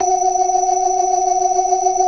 BLOOD OCT, Synth and Organ; InVision Interactive; Keith Emerson; The Most Dangerous Synth and Organ; P.BLOOD OCTV

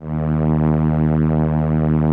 SOLINA, String Machines

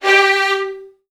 VN 16TH, 1G2; ILIO; Kirk Hunter

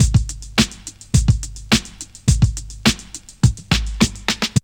break; Zero G - Man Machine

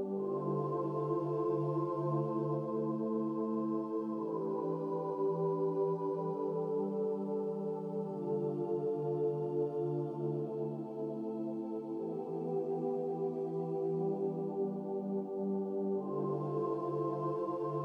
atmos; Polyend; Loops n trouble

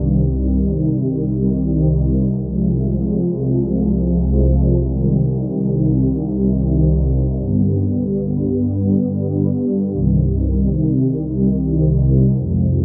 Ocean Pad; Pads; Dr. Fink's Funk Factory

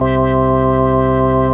ORG VOX C; Roland LCDP09 Keys of the 60s and 70s 1

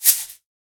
Household Shaker, household rhythm samples Hits

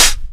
HH mid op, big beat; Big Beat Samples & More; AKAI; HHMIDFX KIT

